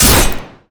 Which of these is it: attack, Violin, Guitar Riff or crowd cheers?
attack